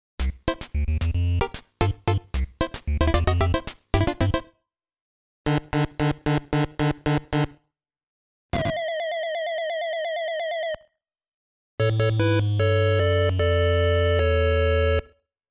Game A Start ~ Miss ~ Success ~ (SUPER) SHARP SHOOTER!; Game Sound Museum Famicom; Kousenshou Series; Wild Gunman; Duck Hunt; Hogan's Alley